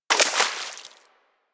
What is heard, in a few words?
Bullet Impact Debris Water